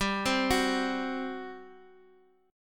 G Major 7th Suspended 4th, G, down, Guitar, Open D